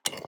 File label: submenu barrier open